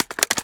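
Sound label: reload mag hit, shotgun; reloads; iw8; romeo870; gmod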